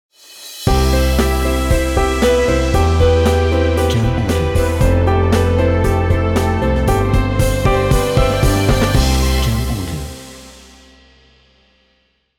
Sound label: Corporate Logo Success